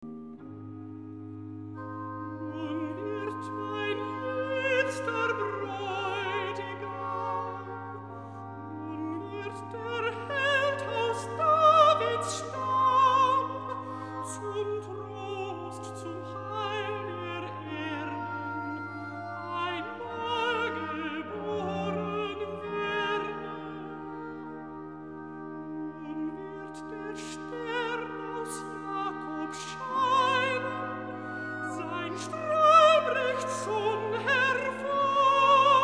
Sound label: Recitativo (Alto); Nun wird mein liebster Brдutigam; BACH; Christmas Oratorio